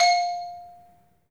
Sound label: 3D MARIMBA; 3D Sound; 3D Keys VOL-1